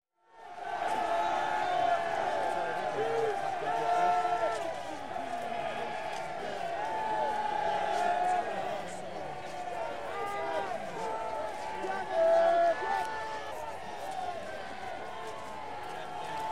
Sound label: Street Crowds - Industrial Dispute, Jeers And Calls; Human Crowds, Children & Footsteps